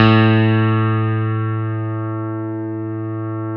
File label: CP-70 A1; E-MU Formula 4000 Series Vol. 5; Protozoa; Vintage Presets